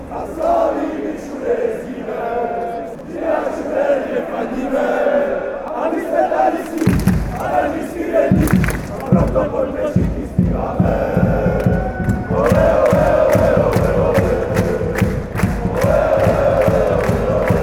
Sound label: vsude jezdime, fanchants